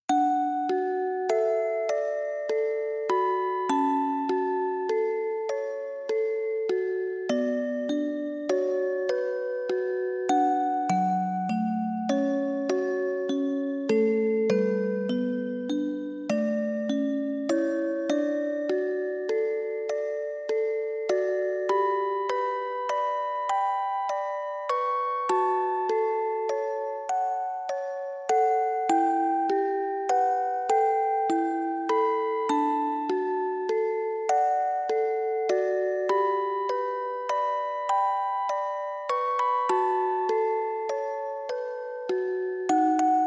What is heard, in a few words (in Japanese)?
オルゴール